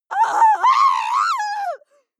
female lunatic scream

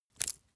small metal crack soft; industrie wirtschaft; metall

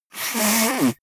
nose blow

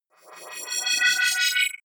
wobble hover swell tonal; energie

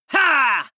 male fight shout; vocals; comedy-cartoon; soundpool

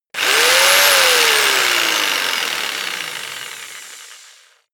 jigsaw engine fast medium length; maschinen; saege; soundpool

